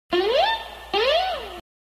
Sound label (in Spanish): Silbido; Radio Capital